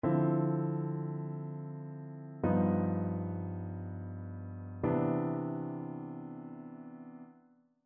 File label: ii V I progressions; ear training